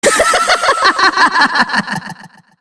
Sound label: laugh